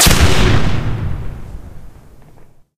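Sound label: explosion generic medium; Source Engine; Portal 2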